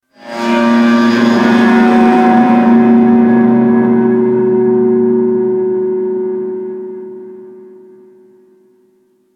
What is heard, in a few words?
Infested Distant Metal Groan, Ambience; Warframe; Corpus Infested